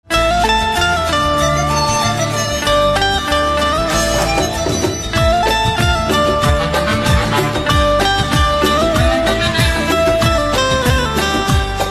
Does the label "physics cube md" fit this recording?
No